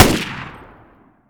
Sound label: turret shoot short close